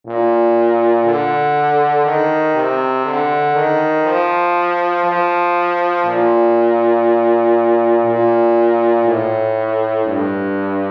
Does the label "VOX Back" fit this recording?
No